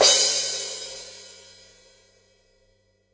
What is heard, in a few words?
crash edge; brass 16